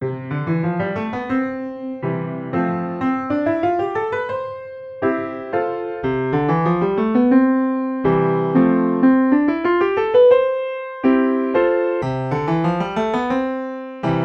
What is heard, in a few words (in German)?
Lauf C3C4 Grands